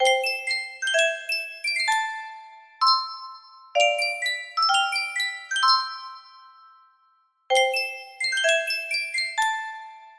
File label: sankyo custom tune music box